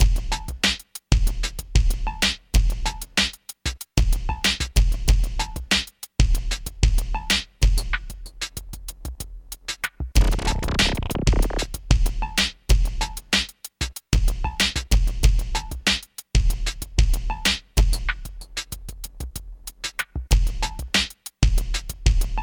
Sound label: generic lose; 1080 Avalanche